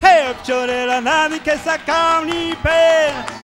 VOC, Gipsy; Best Service; Ethnic Journey Sound Cube

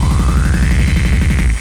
Kaos FX, Ambient Grooves

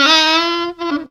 SAX, FUNKY SAX; Zero-G; Funky Elements

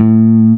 bass 4 (A) w vibrato; Sonic Foundry (Sony Creative Software); Ugly Remnants VOL-2; Basstuff

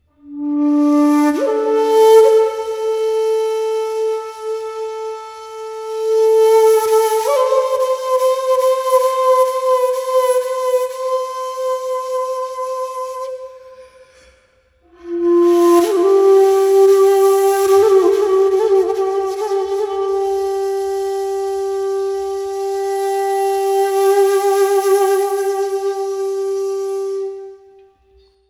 Shaku, Wind Instruments

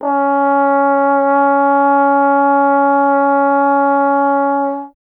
Trombone C#; Miroslav Vitous - Solo Instruments VOL-1

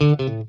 Funk Guitar; RARE R L END; Zero G - Funk Guitar

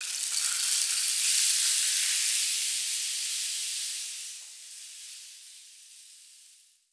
RAINSTKS, RAINSTIKS; Spectrasonics; Heart of Africa 1

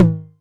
Tom Hi, Novation Drumstation

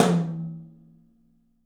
TOM, 3D Drums; 3D Sound

